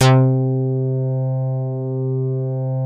OBDX FM, SYNTH BASS; East Collexion; Bass S3000

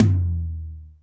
TOM; Best Service Gigapack 2

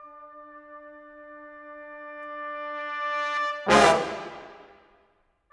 Brass, Orchestral, Rock Pop; Sonic Foundry (Sony Creative Software); Orchestral Series VOL-4 - Rock and Pop

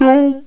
DUM G4, ELEC.VOX; East West - Global Sounds

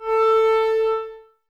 clarinets, Wood Winds

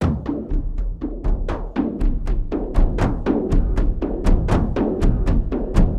Big Drum, Frame Kendang; Sonic Foundry (Sony Creative Software) - Friendly Fire; Drums; Long

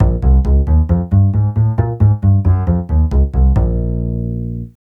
TV Suspense Bass-A#, 135; Zero-G Kocktail Kollection; Construction Kitz (Lounge); TV Suspense Mixes 135

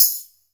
Tambo Hit; Zero-G Jungle Warfare 1, 2 & 3; 90 s Sample CDs

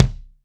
HR16 BD; Crypton - DrumBase5000

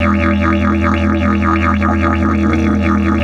150, DIDGE RIFFS

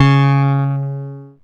C#, PIANO; Big Fish Audio; Synclav FM

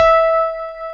CP70 A6, YAMA.CP70; East West; Global Sounds

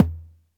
Toms, Elektron MachineDrum SPS1 MKII

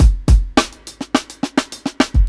105 16th BEAT, drums; LOOPS; Basement drums vol 2

